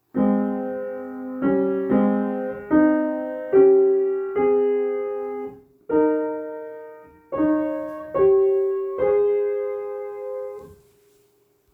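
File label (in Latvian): Intervālu sec. La mažorā; Mūzikas mācību priekšmetu pedagogu veidotie metodiskie materiāli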